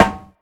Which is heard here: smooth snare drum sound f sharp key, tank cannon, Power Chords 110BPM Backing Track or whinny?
smooth snare drum sound f sharp key